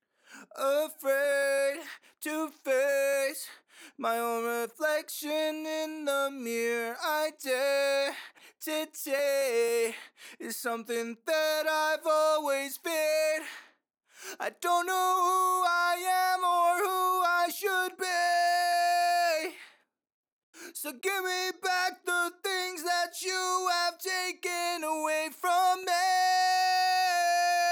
Heavy Harmony Dry, Acapellas, D#min 150bpm; Stems; Ghosthack

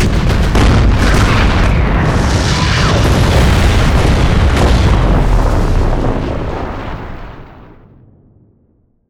Explosion Series

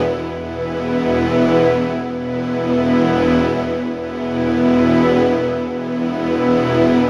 Pad Loop E 135, Techno; Ghosthack; AC2024 - Day 1 - Techno Sounds 2025; Pads

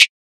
Perc UK; Drum One Shots

